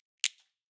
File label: Glasses Crunch